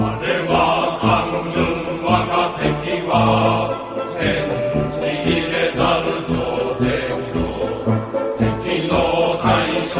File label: imperial japanese march; battotai